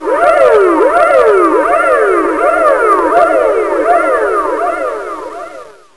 siren

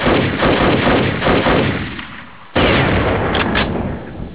guns

